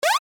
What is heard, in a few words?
pyui